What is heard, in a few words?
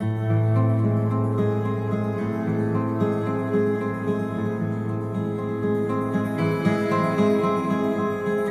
guitar, Sad